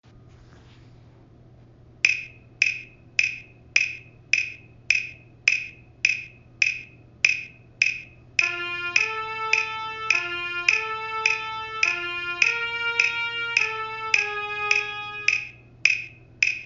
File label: trumpet; harry potter